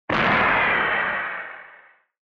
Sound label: Retro Anime Gun Low